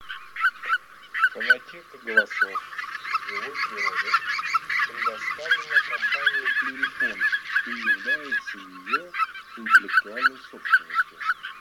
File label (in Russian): Белолобый базар Oche Lombardelle; plurifon